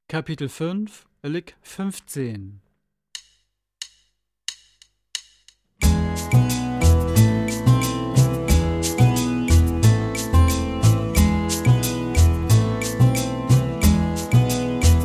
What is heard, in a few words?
Latin Style Guitar